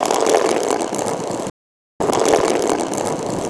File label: Resynth Stone Roll